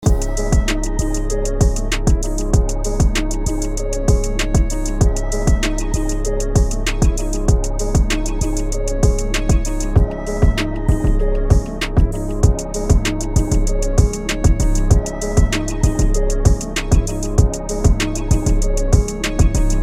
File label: C min 194 BPM